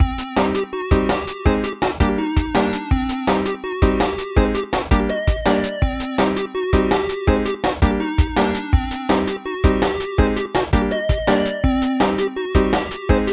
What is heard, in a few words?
Tofu (Instrumental); Friday Night Funkin' This MF Brought Me Tofu